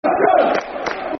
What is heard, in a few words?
Come On Stoke Football Chants (Soccer Songs); Stoke City Scfc